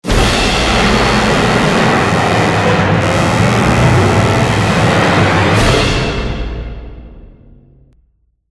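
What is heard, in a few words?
Sting Large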